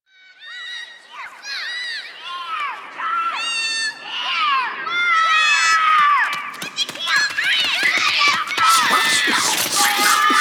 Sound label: Children Town; Eidolon Plains; Cetus Intro; Cinematic SFX